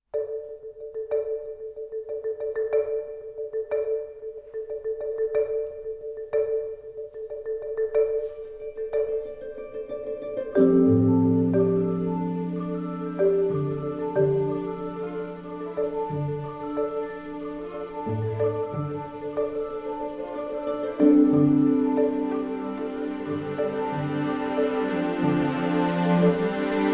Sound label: symphonic version